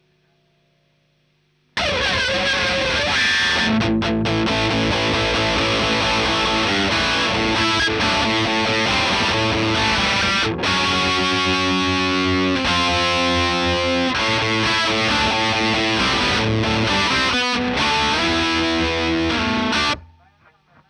Marshal Gtr

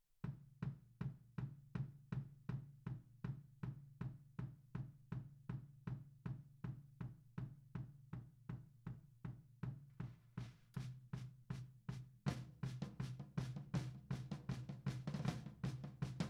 Snare Top, 160 BPM

